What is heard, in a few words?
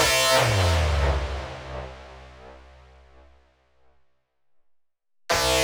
Future Siren B